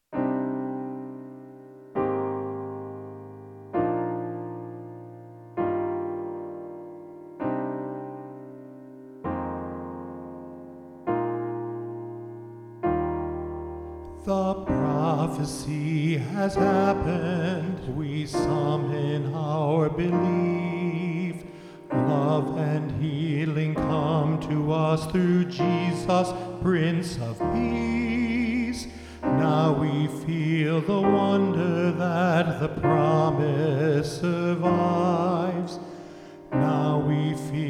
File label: SATB 2 flutes 2 soloists